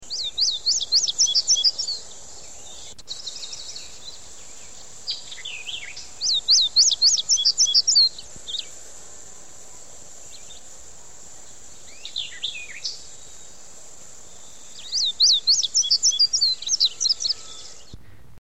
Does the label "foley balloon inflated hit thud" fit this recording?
No